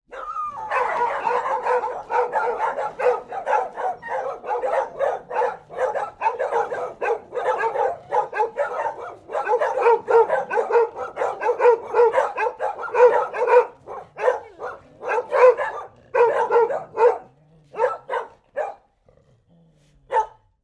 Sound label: animals dogs english springer spaniels x8 stud dogs barking